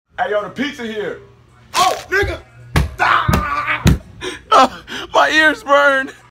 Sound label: screaming in agony